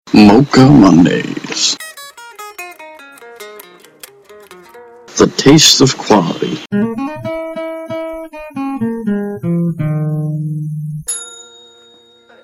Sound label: jingle; mocha mondays